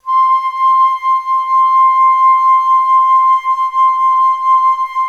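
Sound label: flute legato piano C5, Orchestra; Best Service; Peter Siedlaczek's Advanced Orchestra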